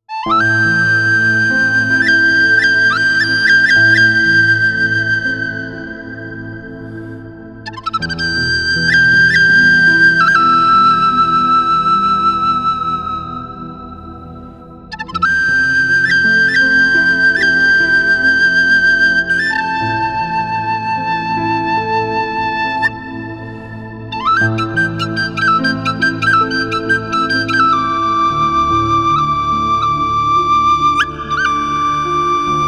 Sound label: solitary bird